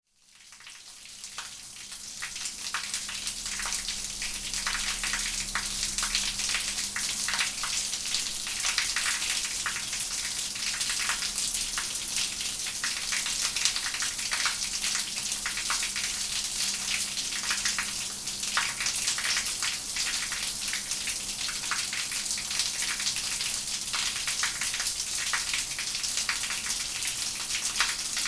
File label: snow melting into storm drain